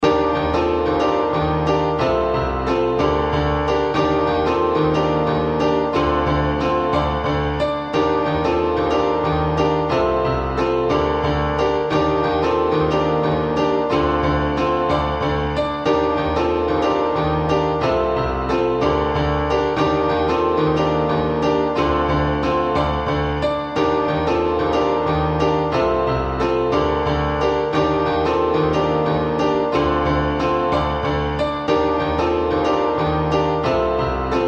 儚くも軽やかなピアノサウンド BPM91

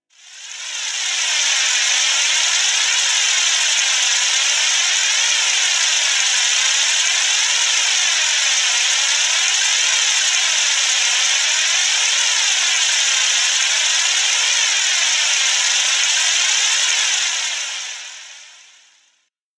激しい雨

